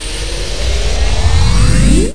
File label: Power Up